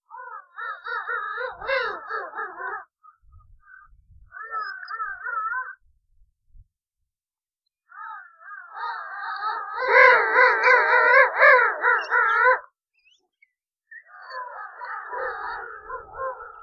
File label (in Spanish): Pinguino rey